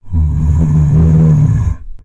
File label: ogre; minecraft; technic